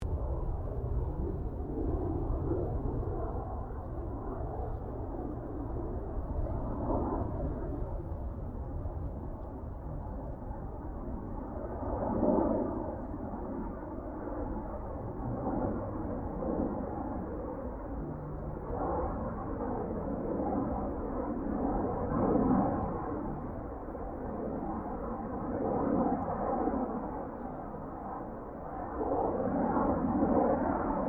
AIRCRAFT GLOSTER METEOR F MK8 PASS DISTANT; Gloster Meteor figter jet; Airplanes (Sound Effects:Vehicles)

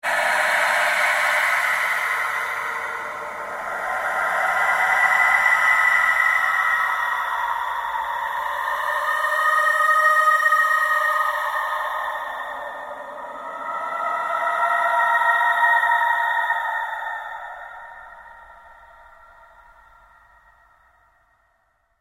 Ambient Drone Slow Sweep; Sound effects created by manipulating other sounds; Drones (Sound Effects:Sound Design)

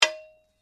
Blade Heavy Moving Impact, Sword; Foley sounds for melee weapons; Combat

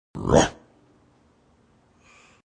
Evil Ruff, A deep evil short barking sound; Devil Voices; Horror

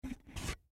Bottle Water Empty, Bottle Water Empty Pick Up; Cement Plastic; Pick Ups; Objects; Blastwave; Foley

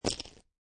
Poker Chips Dropping On Playing Cards; Poker, gambling, chip; Card Sound Effects

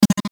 button chopper; Multimedia; Buttons

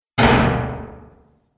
Exploding Bang, bomb sound effect; Bomb Sound Effects; Explosion; Bombastic Booms And Battle Ambience

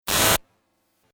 Static Glitch; sound design

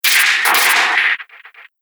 Dark Horror Hits; Miscellaneous Impact Sound Effects; Impact / Explosion Sound Effect

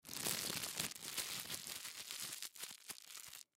Plastic Wrap Move, Plastic Wrap Movement Crumple; Materials; Household

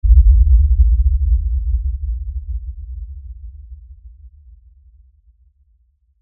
LFE Impact; Production Element, Imaging Element, Accent, Transition; Low Impact; BlastWave

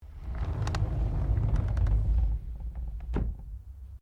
Drawer Interior Wooden Bathroom Large Full Close; Various domestic drawers; Household

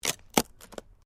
WOOD PLANK TWIST

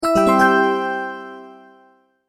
multimedia tone alert; Tones; Sound Design

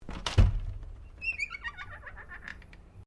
Door Pivot Interior Wooden Louvre Open Squeaky

